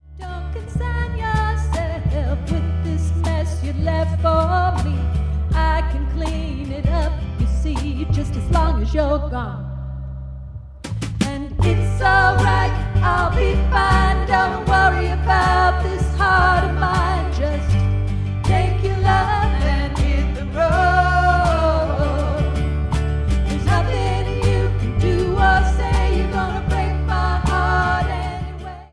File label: acoustic snippet